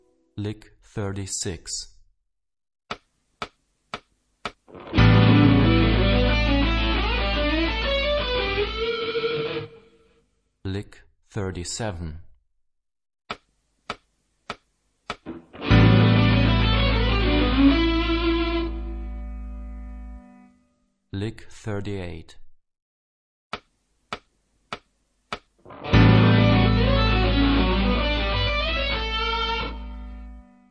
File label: Rock guitar; Rock gt Secrets